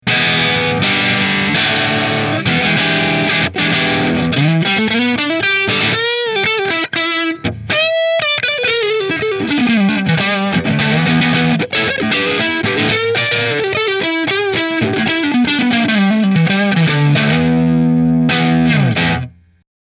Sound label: Nola Model 8 Driftwood Neck Marshall